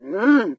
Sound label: Calf Boy Scream; Farm Mania 2